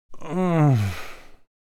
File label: Adult male pleasure moan